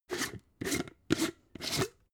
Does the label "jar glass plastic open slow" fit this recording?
Yes